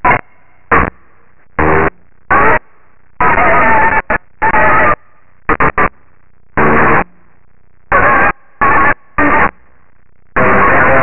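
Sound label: guitar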